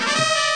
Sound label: trompet; MOBICLIC 13